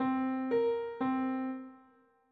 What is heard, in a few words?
minor 3; minor 7; piano